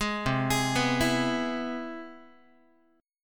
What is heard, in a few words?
C Augmented Major 7th, Guitar; arpeggiated; JGuitar